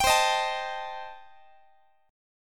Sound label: C Minor 6th Guitar, chord; Cm6 strummed; x,x,22,22,19,20